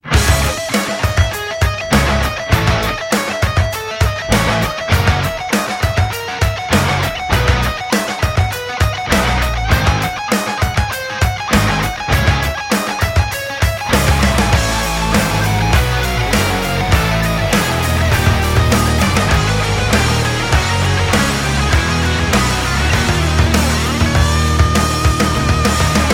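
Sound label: epic guitar beautiful solo on electric guitar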